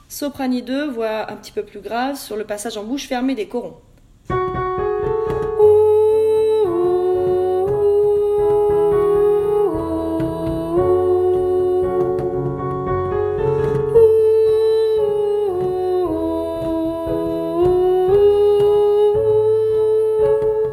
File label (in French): Corons Soprani2 Bouche fermée